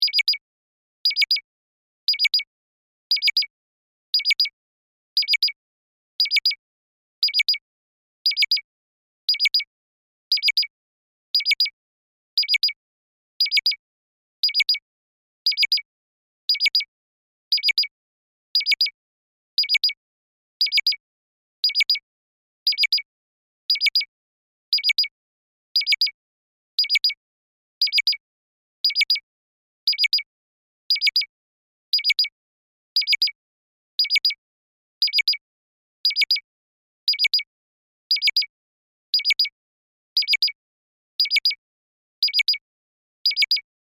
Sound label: Telemetry Blips, Scifi, Telemetry, Blips, Science, Fiction; Communications